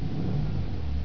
Car; ojrace

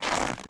teleport; Szkola Koziolka; CyberMycha 2003 October

